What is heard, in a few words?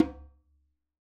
djembe Single Hit Right Hand Tone; Raw Perc One Shots; Slate; SD SEISMIC Drum Sample Pack